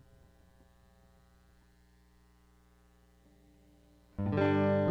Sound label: rock